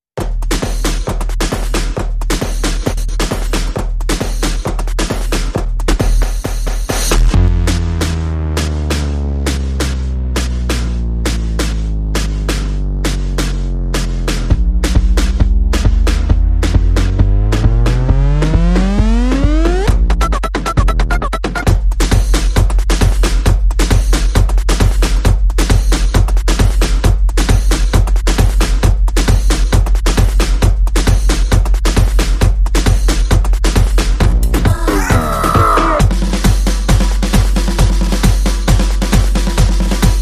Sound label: No Vocal